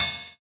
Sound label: Cymbal, JUNGLE BREAKS DRUM SOUNDS